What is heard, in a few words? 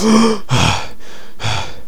gasp; assassin